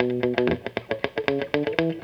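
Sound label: guitar, left; USB Soundscan vol.32; AKAI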